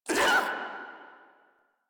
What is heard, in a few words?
Infested Charger Pain; Warframe